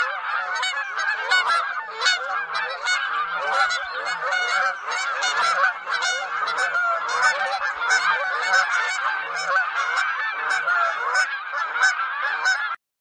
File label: canada geese